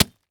Horror Scary Arm Slicing Off

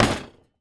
Impact Metal Wood Impact Short

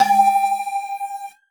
Beep Long

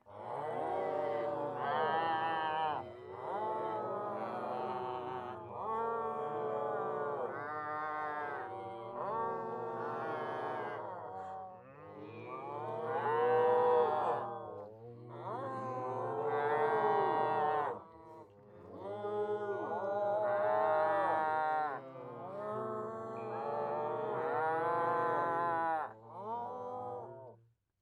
Human Crowd Cattle

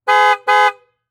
Transportation Jeep Commander Horn Multiple

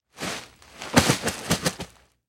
Impact Human Tumble Through Grass; Impacts

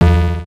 Multimedia Internet CD-Rom Flash Beep Electronic

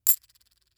Foley Coins Single Coins Cling While Counting

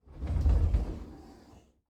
Household Door Open Bathroom Closet Slowly

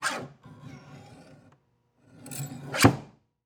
Household Paper Cutter Slice Paper

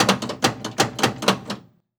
Electronic Pinball Machine Buttons; AdobeCC

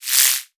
Multimedia Internet CD-Rom Flash Electronic

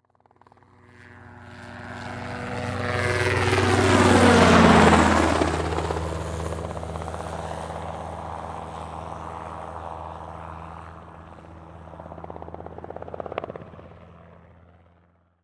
Helicopter Fly By Medium; transportation

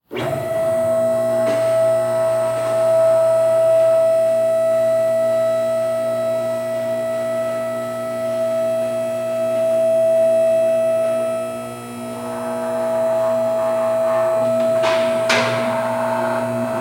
Industry Car Mechanic Lift Move Up

